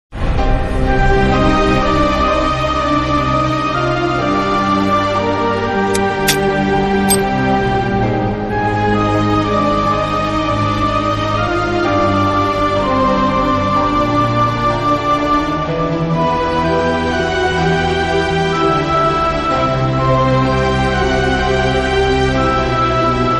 sad ringtone, emotional ringtone

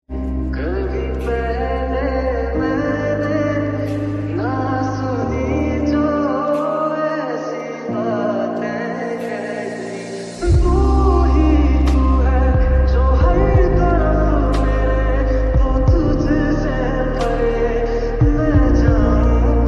lofi song ringtone romantic love ringtones

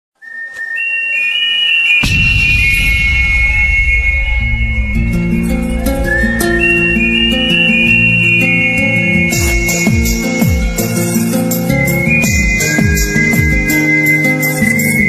bengali ringtone

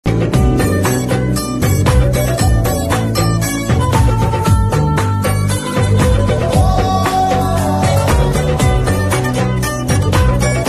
bgm ringtone love romantic; gabbar singh movie ringtone; mobile ringtone

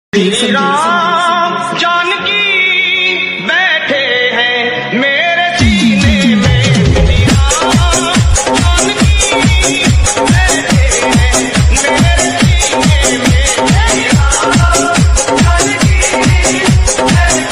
bgm ram ringtone; जय श्री राम

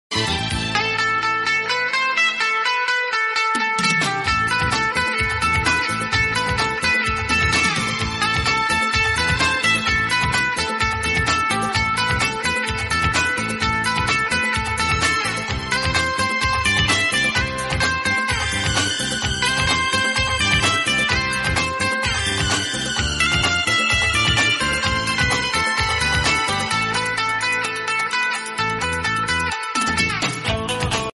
dj remix

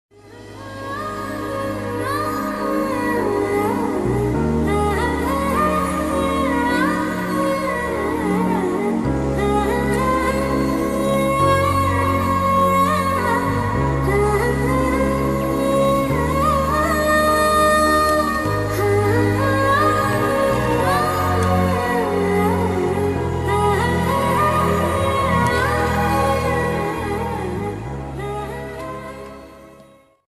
love bgm; sardar gabbar singh movie

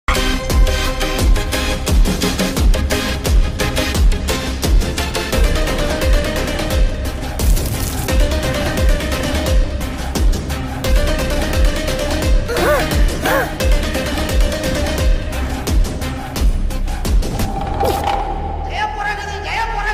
trailer bgm; ripupbury trailer; ripupbury movie

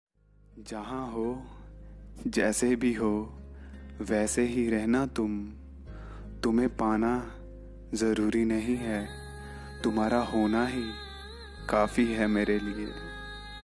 sad songs

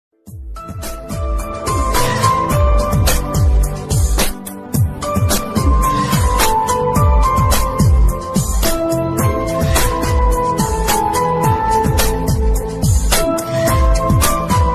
movie love bgm ringtone kannada ringtone love bgm; addhuri; dhruva sarja

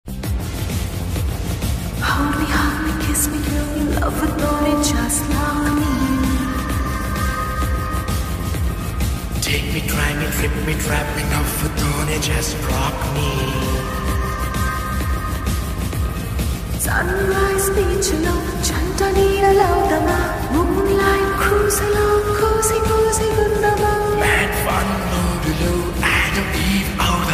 Song Ringtone; kanguva movie

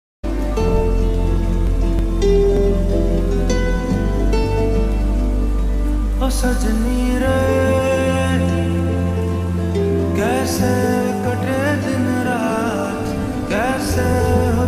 Lofi Ringtone Slowed Reverb Ringtone

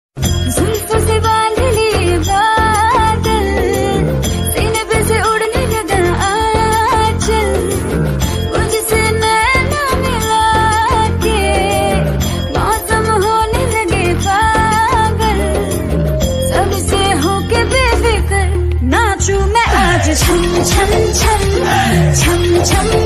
hindi love song ringtone bollywood; baaghi movie

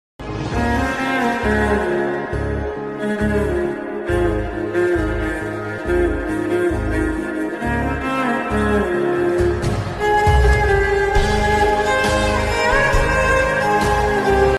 violin ringtone instrumental ringtone bgm

